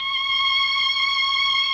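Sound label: MELLOSTR, Mellotron Violins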